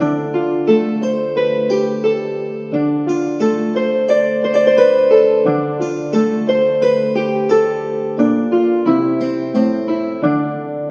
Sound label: harpsy chords; Loops; Electronic